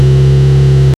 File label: v6 car motor sound